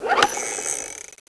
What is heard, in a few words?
body plunger